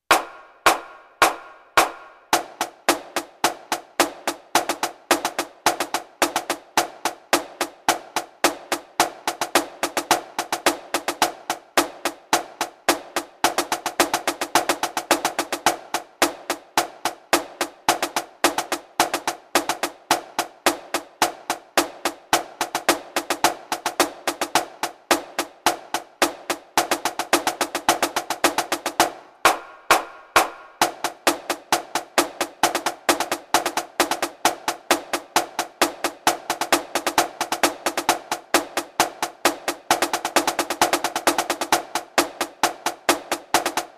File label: paradiddles